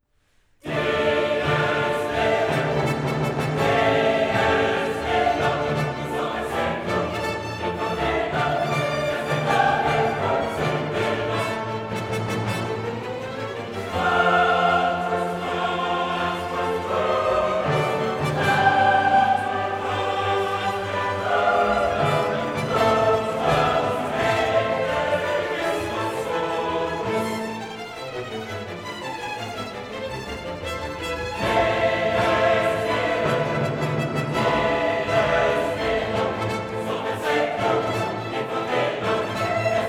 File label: Requiem for soloists, chorus, and orchestra, Dies irae; Linn Selektions; The Super Audio Surrond Volume